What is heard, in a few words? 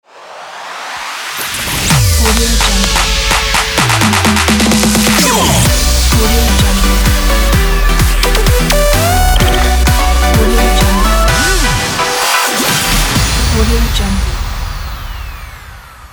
upbeat dance future logo